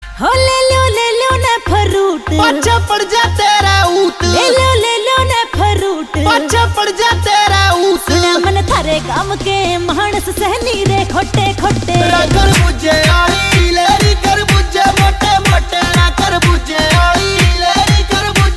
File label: Ringtone